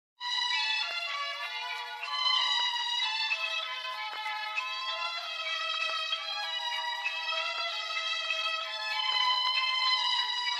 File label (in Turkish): speed up sadece muzik; melodi ve rahatlatici zil sesleri